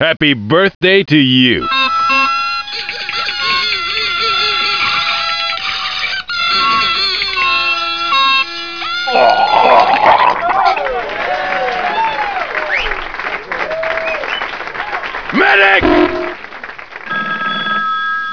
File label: Birthday Suprise